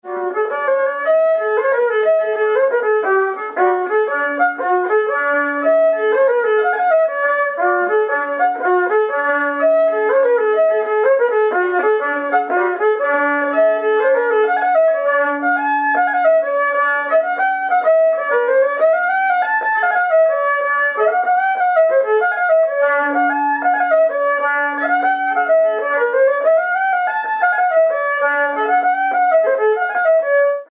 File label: Slide; Scattery island